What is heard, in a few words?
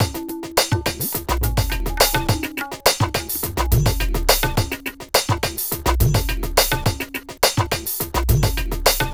Indio groove; Sonic Foundry (Sony Creative Software); Ugly Remnants VOL-2